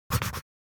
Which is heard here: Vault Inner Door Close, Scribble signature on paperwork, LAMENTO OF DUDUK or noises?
Scribble signature on paperwork